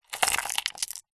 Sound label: gems jewels stones in small container tip out into hand